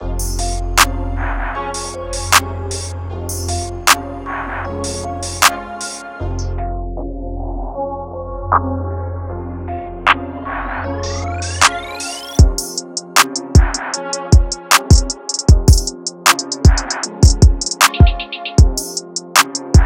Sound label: drumkit; SHOBEATS